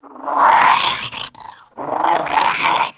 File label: small raspy creature snarling